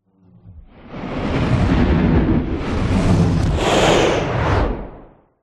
fantasy magic spell whoosh ball of energy pass by fast